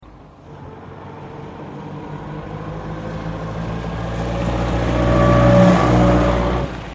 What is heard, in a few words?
car approach